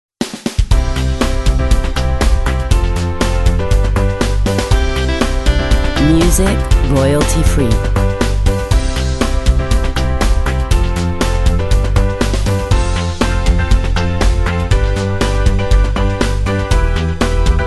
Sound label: Upbeat Happy